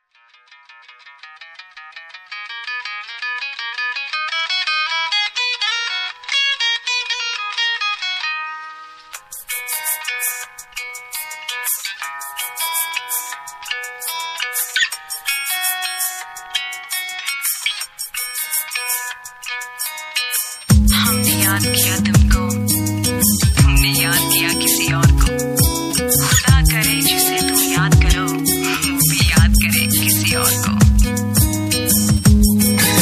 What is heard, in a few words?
Asian Groove; putumayo cd